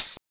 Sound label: Oberheim DX